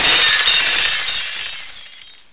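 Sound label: glass break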